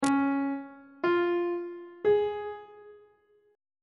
d flat lydian chord 1 is d flat major on treble clef